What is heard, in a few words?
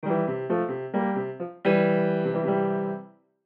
Piano Blues Riff, Piano Blues Riff Chord; Musical; Pianos; Blastwave; Sound Effects:Musical